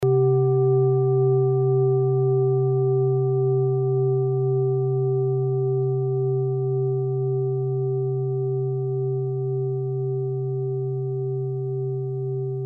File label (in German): klangschale